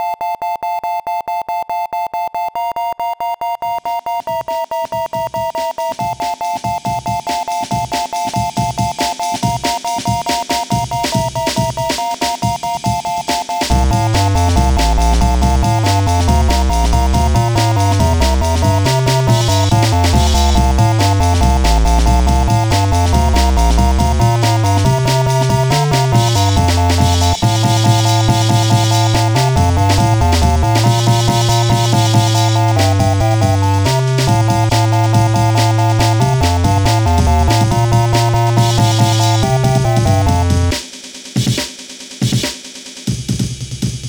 Jingle Bits

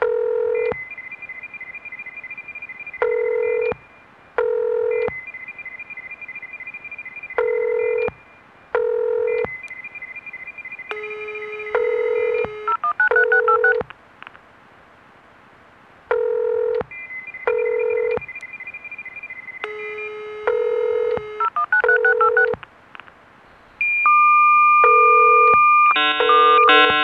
Modem Music

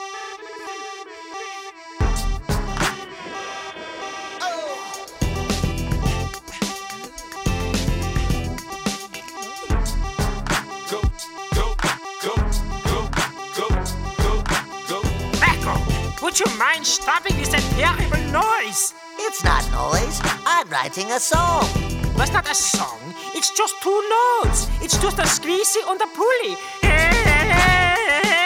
two notes

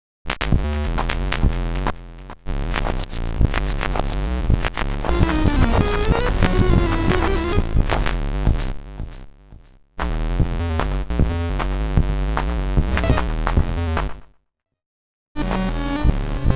Shuffled Bits

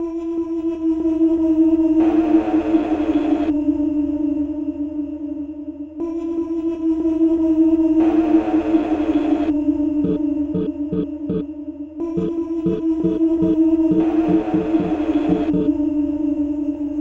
spooky

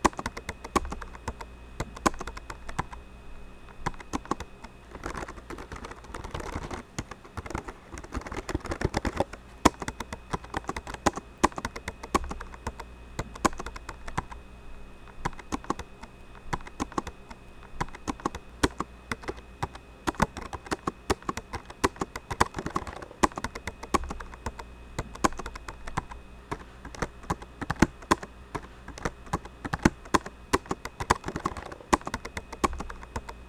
Keys

